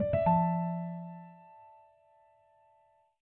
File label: Crystal, notifications, Calm; Samsung Galaxy S26 Ultra